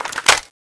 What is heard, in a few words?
clip out; tfa cso; skull4